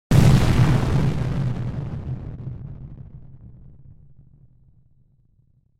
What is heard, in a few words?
explosion; 攻撃